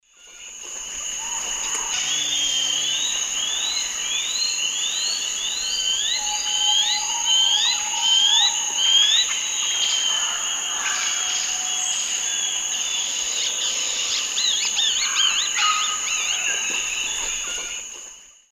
Myrmotherula longipennis longipennis, song and call, S. America Eastern Lowlands